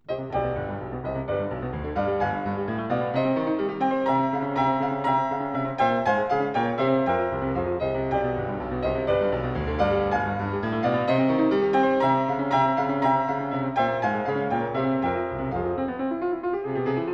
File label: Variations and fugue for piano in E flat major